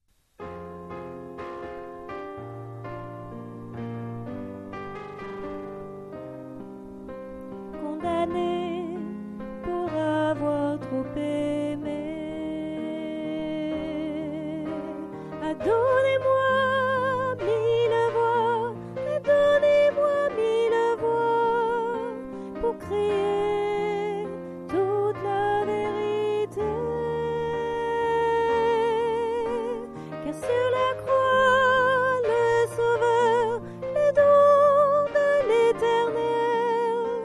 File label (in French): TENOR